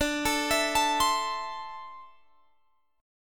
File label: D 7th Suspended 2nd down Guitar Open G